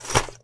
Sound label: Webbing; Player; Footsteps